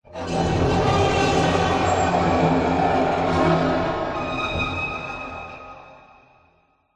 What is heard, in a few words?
horror metal scrape processed evil scray